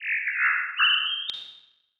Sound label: jungle, jungle ambiance